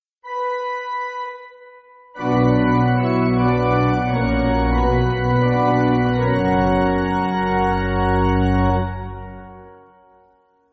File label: Amen Pipe